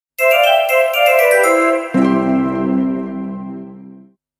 legkij i priyatnij ritm perehoda

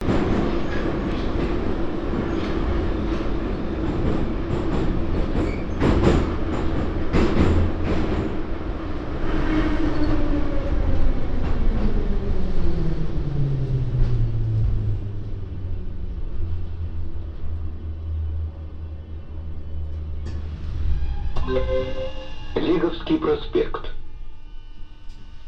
metro obemnij 3d zvuk

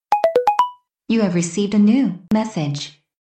tu tu tu tu tu

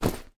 neakkuratnij zamah sumkoj

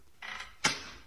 zvuk pruzhini byushej po chemu to

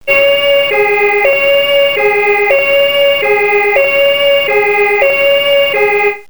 avarijnij zvuk avtomobilej

